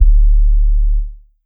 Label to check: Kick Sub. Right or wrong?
Right